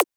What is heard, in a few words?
Transient; FLPRO